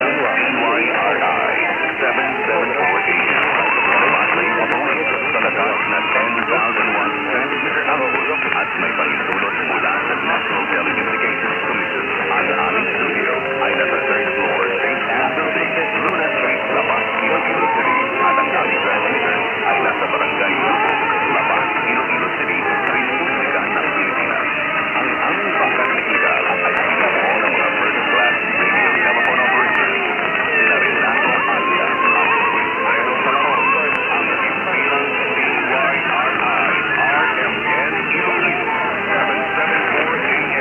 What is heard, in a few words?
774KHz; DYRI